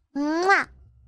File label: muah